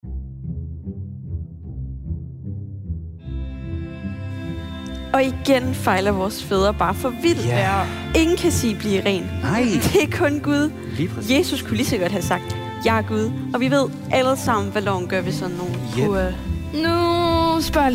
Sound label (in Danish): Drama musik og drama